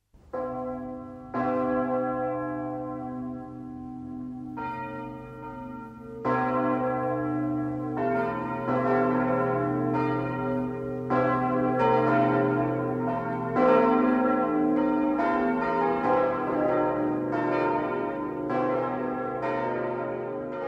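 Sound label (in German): Tonaufnahme c1 es1 f1 as1 b1, mehrstimmige Geläute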